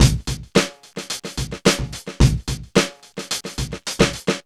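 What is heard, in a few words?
109 bpm, Funky loops; Zero-G; Funky Elements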